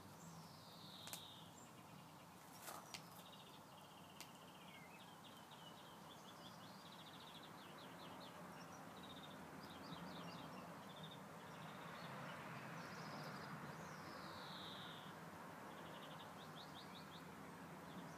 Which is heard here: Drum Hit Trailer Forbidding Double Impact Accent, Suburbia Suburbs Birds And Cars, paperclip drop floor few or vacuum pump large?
Suburbia Suburbs Birds And Cars